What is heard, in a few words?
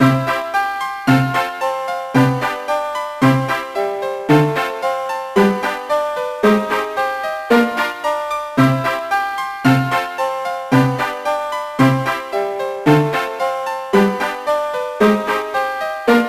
Beat Boss; Barbie in the 12 Dancing Princesses; GBA